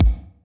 kick; Electro; futurefunk

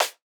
Modern snare, Electro

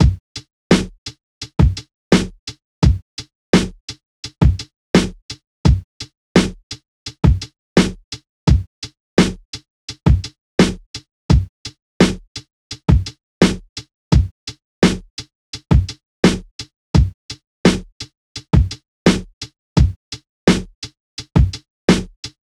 Hiphop loop

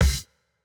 Jrock kick

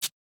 hihat, house

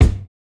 kick, house

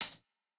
Jrock hihat

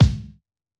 Hiphop kick; Reverb+EQ+Comp+Limit

